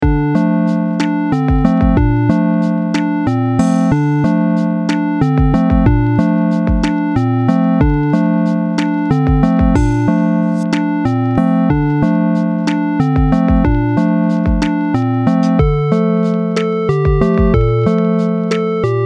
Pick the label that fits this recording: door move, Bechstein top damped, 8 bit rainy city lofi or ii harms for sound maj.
8 bit rainy city lofi